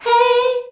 "HEY" B, FEMALES

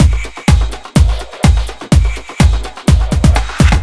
Full, Drum Loops 125bpm, Nu Dance Electro; Groovin Noise

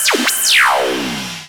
Laser; Centuries

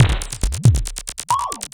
percussion loops, 138, Techno

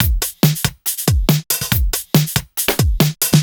Break Beats, 140 BPM

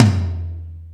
Toms, E-MU Pro-Cussion

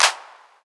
Clap; Drums; Claps; Best Service; House Definition VOL-1

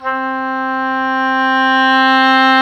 oboe, Roland

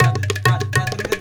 India, Heart of Asia

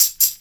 TAMB, Percussion Slam; InVision Interactive

